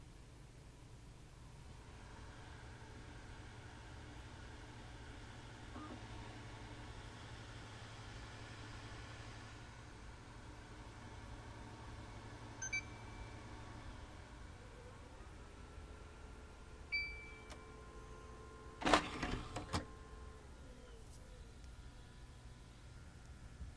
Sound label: xbox 360 slim